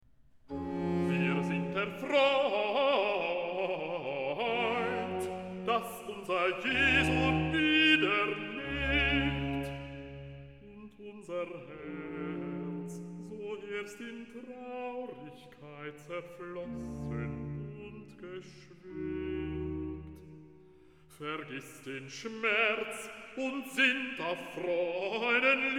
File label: Recitativo (B); Bach - Easter Oratorio; Bach, J S; Oster-Oratorium, BWV 249; Wir sind erfreut